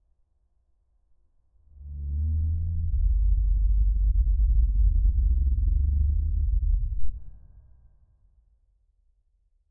organic growth sound spla, organic

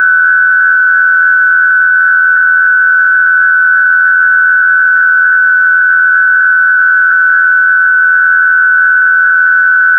create a soft low intensi, aluminum foil

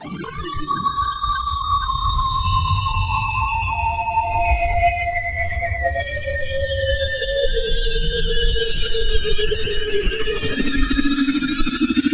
pulsar spinning, astronomy

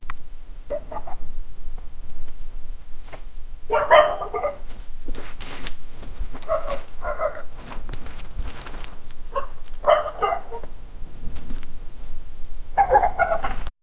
the sound of a drop, animal sounds

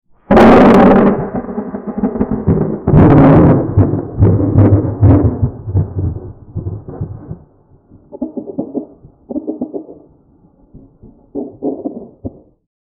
cartoonic thunderstorm

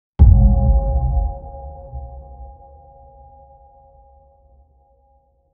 out of mana sound short, mana

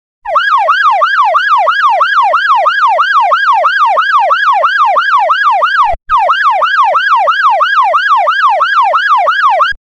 police siren sound, emergency vehicle

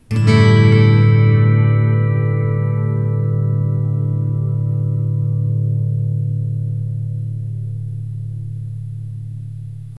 acoustic guitar strings

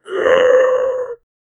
occasional anguished groa

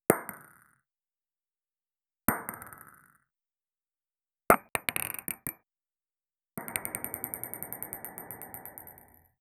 8-bit

